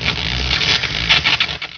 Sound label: power